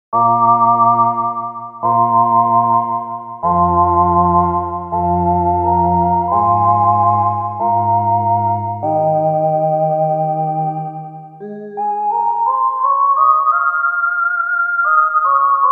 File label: Space Ahh, Roland JV-880; Factory Patches